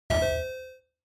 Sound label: Quiz Button Multi; クイズ ボタン02-1(マルチ)